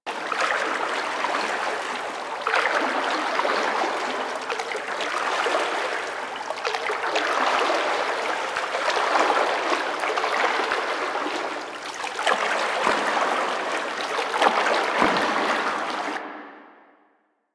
Ocean Wave Lap Loop; Ambience; Props; Warframe; Grineer Ocean

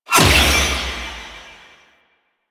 Shackle Impact; Warframe; The New War; Deacons

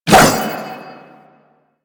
Sword Swipe; Warframe; Duviri; Duviri Dax; Weapons

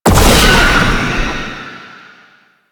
Unhallowed Curse Hit; Warframe; Warframes; Dagath

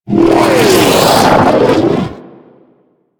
Capital Ship Missile Whizzby; Warframe; Corpus

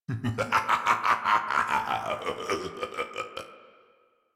laugh; Warframe; Tyl Regor Boss; Efforts

